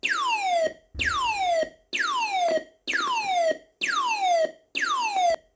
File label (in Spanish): tono